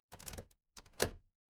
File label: door lock